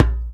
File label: djembe; Univers Sons; Basicussions